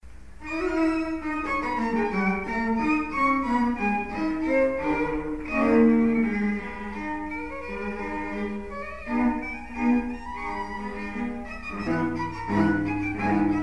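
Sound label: string trio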